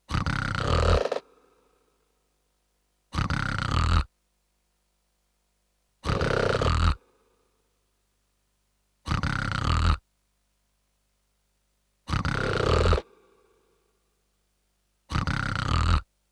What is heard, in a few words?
Snoring; Mario; Paper Mario 2 The Thousand-Year Door